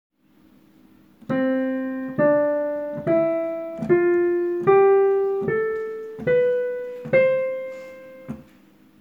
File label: C major scale